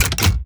case unlock immediate; ui; Counter Strike Model Pack; CS:GO; panorama

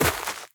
step light, footsteps player grass

